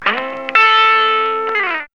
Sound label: GTR PIK